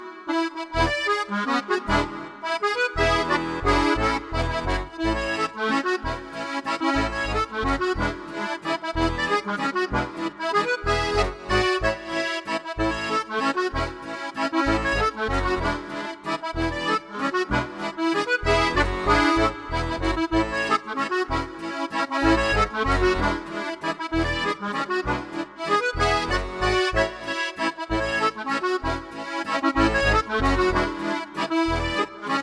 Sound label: landler